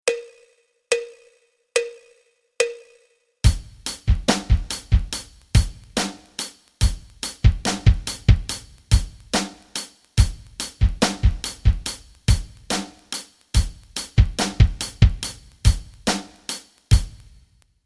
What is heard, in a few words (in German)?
rock rhythmen